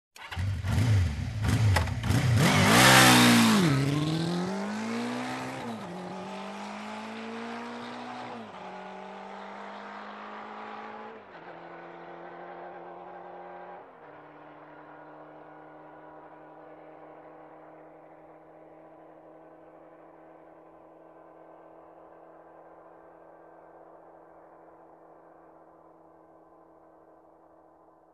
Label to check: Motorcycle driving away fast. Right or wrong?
Right